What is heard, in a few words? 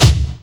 hit, percussion hit